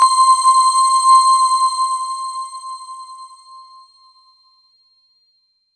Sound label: C5, Roland JV 2080, JUNGLE BRASS